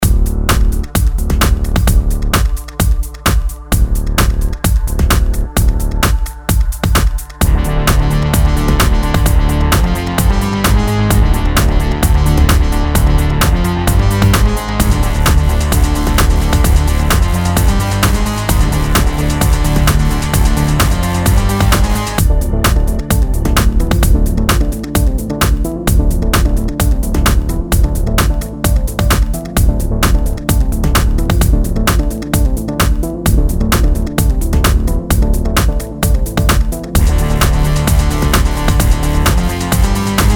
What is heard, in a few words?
big beat